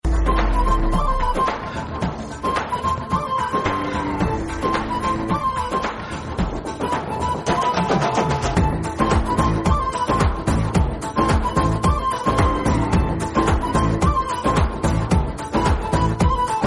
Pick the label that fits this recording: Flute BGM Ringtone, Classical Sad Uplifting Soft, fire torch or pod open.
Flute BGM Ringtone